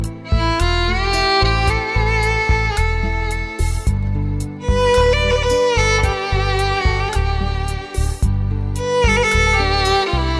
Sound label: Instrumentals